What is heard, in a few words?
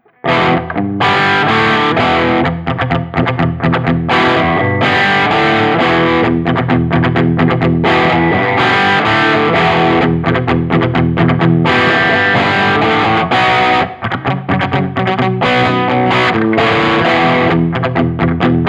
Guild Starfire III, HiWatt; Westerly-made; Guild Starfire III from 1997